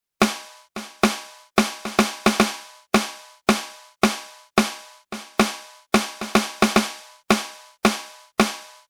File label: Snare Drum none; Drums 2a: Snare Drum, no compression